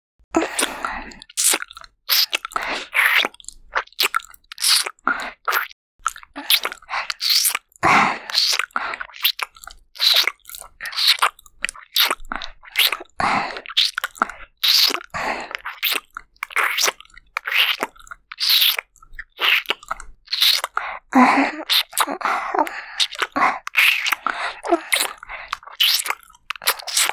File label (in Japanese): 感じるキス, ASMR